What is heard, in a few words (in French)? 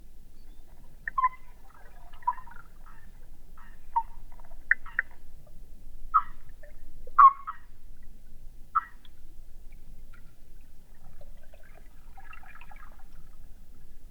Grenouilles sous l'eau